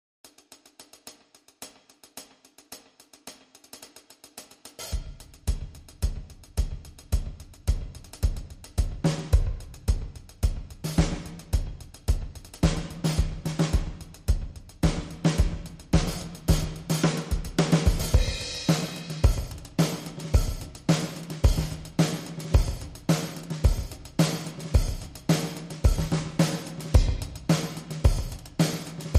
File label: Drums Clean